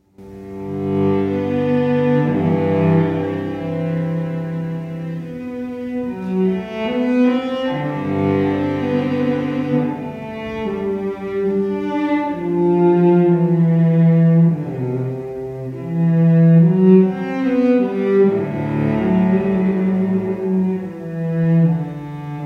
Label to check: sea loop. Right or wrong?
Wrong